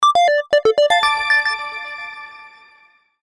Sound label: cross, notifications; BenQ B502